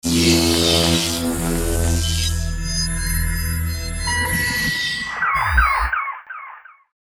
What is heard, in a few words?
electric movement